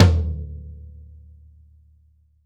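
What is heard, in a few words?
floor tom; drum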